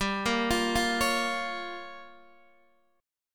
G Minor 7th Flat 5th, Guitar Open D

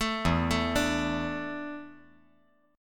E 7th Suspended 4th E down 5G 4C 5E 5A

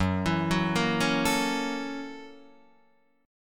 Gb 7th Sharp 9th Gb Guitar Open D

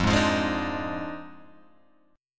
F Minor 13th, Guitar Open G

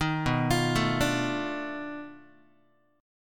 B 7th Sharp 9th Flat 5th, down, Guitar; B7#9b5 arpeggiated; 6,7,8,7,6,x; JGuitar

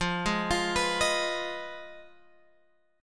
F Minor 7th Flat 5th, Guitar, Open G

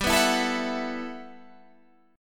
B 7th Suspended 4th G; strummed

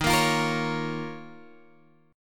D sharp 7th Suspended 2nd, Guitar, Open G

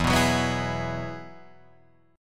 E Major Add 9th, Guitar Drop D

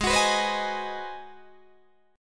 Ab 7th Sharp 9th Guitar Open D, chord

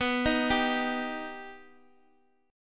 B Augmented, Guitar, Open D

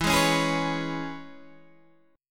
E 6th, down, Guitar, Open D

